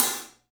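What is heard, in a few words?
SABIAN HAT, left; 3D Sound; 3D Drums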